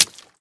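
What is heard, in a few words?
press button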